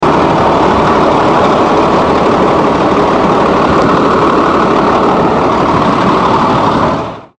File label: diesel